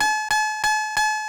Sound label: got item; SNES; SMK